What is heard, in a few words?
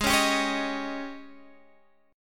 G sharp Major 7th Suspended 4th, chord